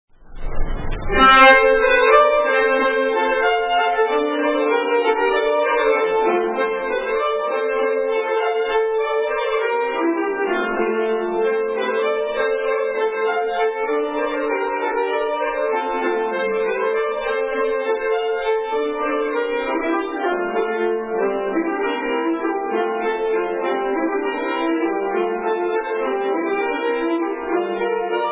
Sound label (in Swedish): Polkett